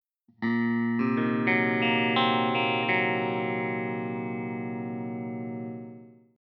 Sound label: open tuning